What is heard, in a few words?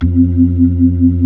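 B-3, Hammond B-3 organ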